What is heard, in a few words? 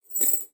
Coins